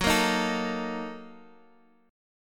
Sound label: Bb Major 7th Suspended 4th Sharp 5th